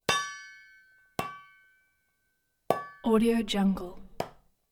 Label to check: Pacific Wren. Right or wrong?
Wrong